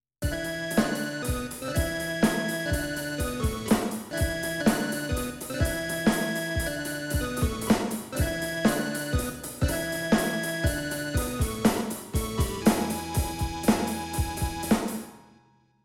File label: Reverb